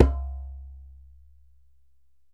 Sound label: DJEMBE, African Drum